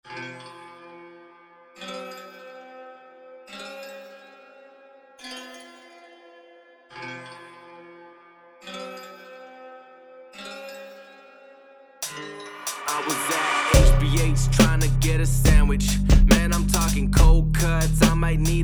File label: Digi Drumcircle